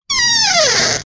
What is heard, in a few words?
Creaking Door; horror sfx vol 1